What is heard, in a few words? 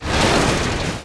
crash soft, vehicles; Tribes2